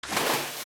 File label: Splash; Morpha; zelda; OOT